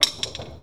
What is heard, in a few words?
Drop, INDUSTRIAL